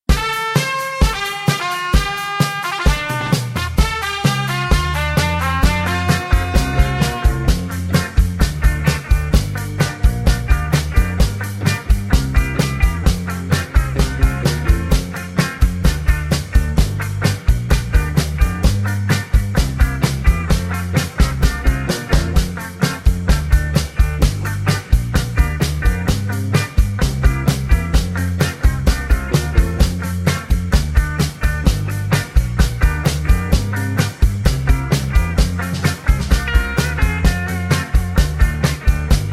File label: (Gb To Ab) No Backing Vocals